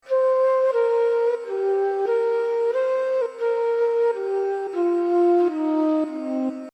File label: Jhaptal C 90, tabla